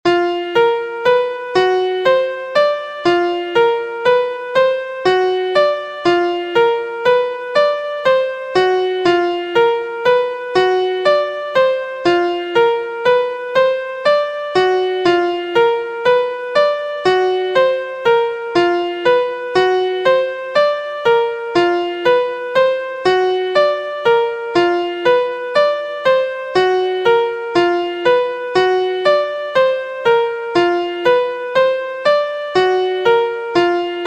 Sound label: Degree 1 b2 2 3 5 b6 2nd Inversion Key Bb; harmonic and melodic equivalence v14h trichord pair